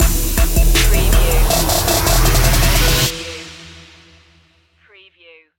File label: anomaly stinger